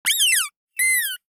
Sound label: Rubber Toy Squeak; Mechanical Wave